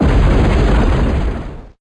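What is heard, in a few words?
explosion with debris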